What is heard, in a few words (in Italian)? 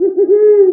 gufo, versi degli animali; animalandia